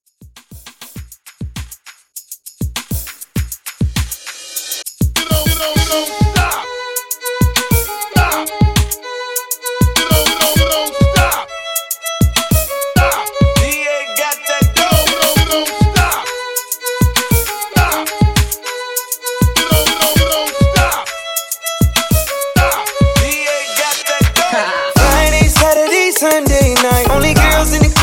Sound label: RB with Hype Redrum 100 Bpm